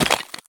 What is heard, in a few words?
sprint tile; Escape from Tarkov